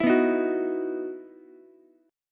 E Major 7th Suspended 2nd E down Ukulele; strummed; JGuitar

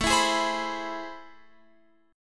A Minor Major 9th, down, Guitar, Open F; strummed; JGuitar

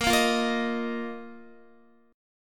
A sharp Suspended 4th A sharp, Guitar; x,13,10,10,10,11; 4F,4A,5C,5G,5C,6E; strummed

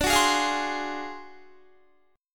D 7th Sharp 9th Flat 5th, Guitar; strummed; chordshape

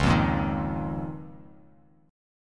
C sharp 6th Add 9th, Bass Standard; strummed; C#6add9; 9,8,8,8; JGuitar

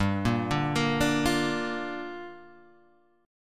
G Minor 7th

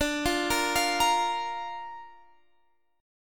D Minor Sharp 5th D down Guitar Half Step Down

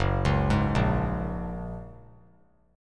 F sharp Major Add 9th; F#add9 arpeggiated

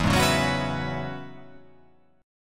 E 6th Add 9th E down

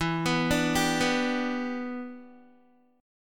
E Minor 7th, down, Guitar; Em7; arpeggiated

